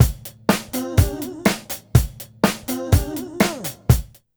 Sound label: BEAT, Roots Disco; USB Soundscan vol.32; AKAI